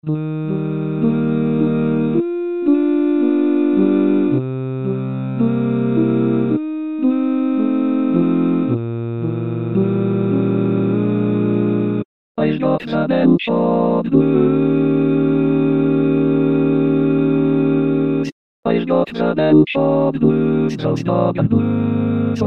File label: Bell Chord Blues